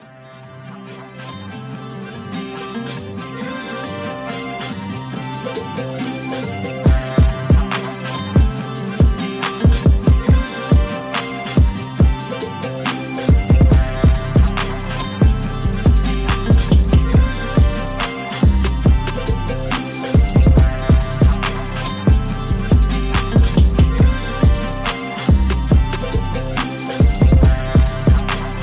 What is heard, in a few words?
Trap mix; Globo Rural